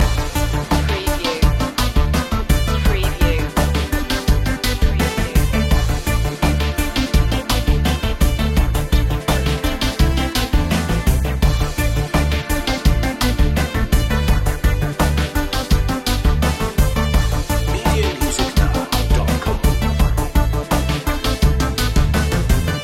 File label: astronavigator loop